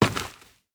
dirt footstep

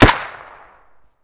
ak2012 silencer, weapon

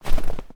snow footstep

